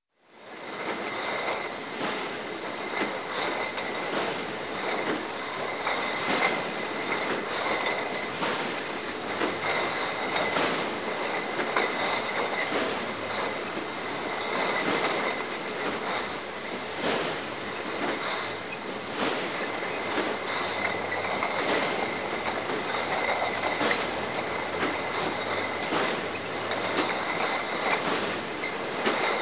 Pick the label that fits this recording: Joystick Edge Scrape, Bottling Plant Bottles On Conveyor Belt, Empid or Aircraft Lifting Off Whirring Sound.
Bottling Plant Bottles On Conveyor Belt